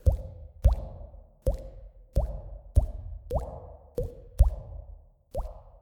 Drop Of Water

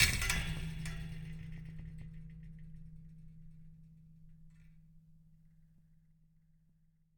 Flick

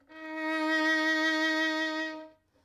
Violin D 5 Tenuto Vibrato

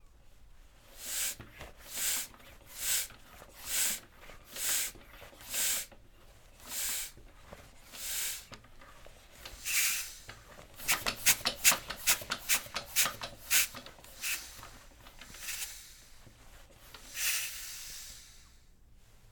Bike Pump

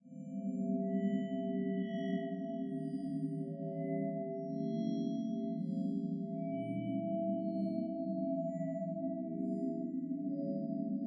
Tonal Metallic Resonant Mysterious Texture

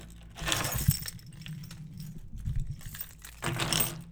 Chains rattling

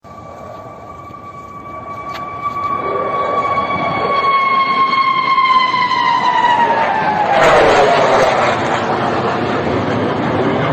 Su 57 Howl

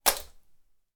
Splat Ish

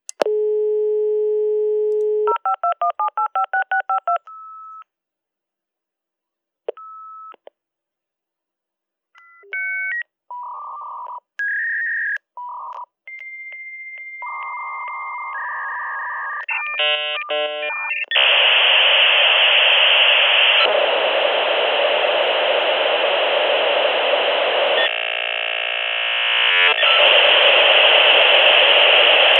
56k modem

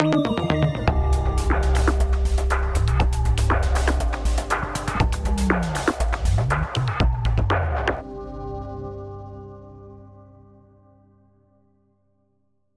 News Cue Full Jingle

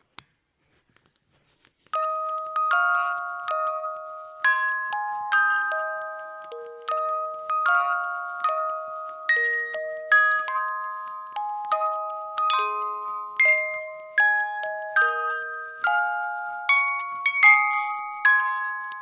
Happy Birthday Music Box

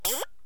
Boing

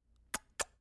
Male Kiss Kiss

